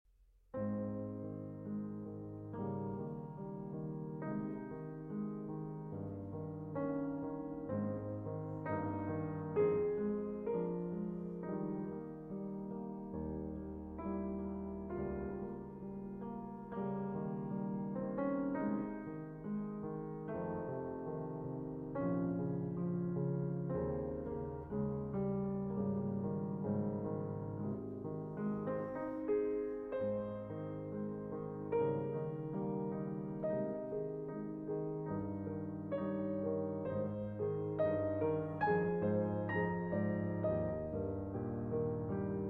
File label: Piano Sonata No. 8 in C Minor II. Adagio cantabile; Op. 13 Pathétique